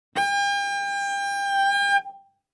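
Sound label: Cello arco fortissimo sul A G4; MIS Pitches - 2014; Strings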